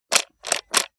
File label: robot handling